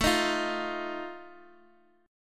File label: Eb Suspended 2nd Flat 5th, A